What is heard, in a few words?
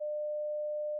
bleep soft 600hz